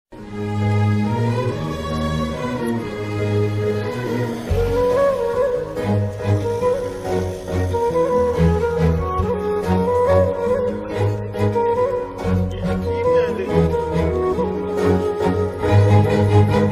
movie bgm ringtones

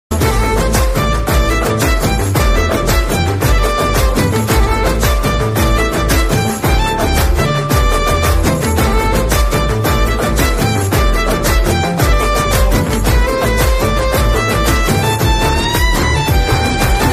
bgm ringtone, telugu movie bgm; das ka dhamki

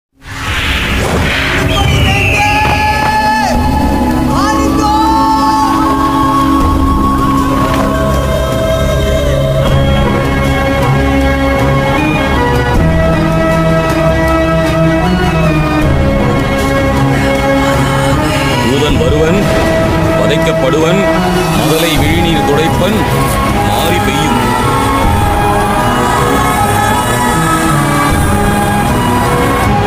heart touching bgm; Aayirathil Oruvan

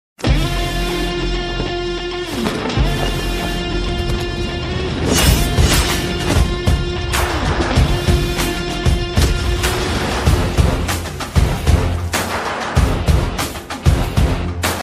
movie bgm ringtone; acharya; telugu bgm ringtones

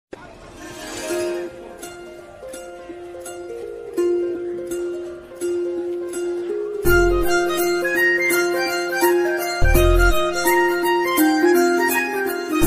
tamil love ringtone south indian love bgm; tamil romantic love bgm

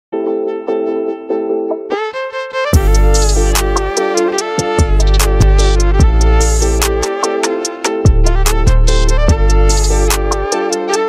violin ringtone; shiva bgms

